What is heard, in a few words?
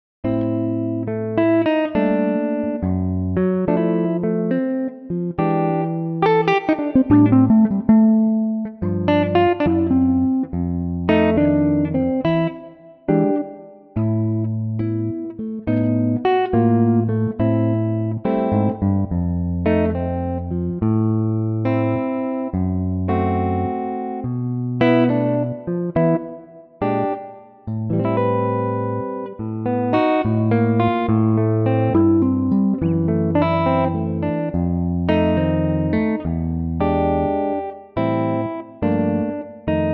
Am Les Paul Guitar, electric guitar